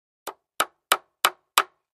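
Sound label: 2x4 Hammer Nail On Cement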